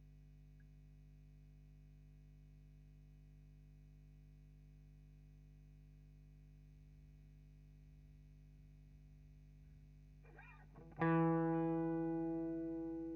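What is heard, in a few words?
GTR Royer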